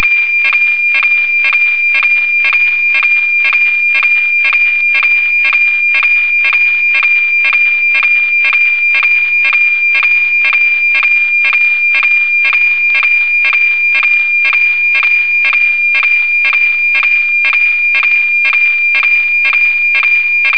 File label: Meteor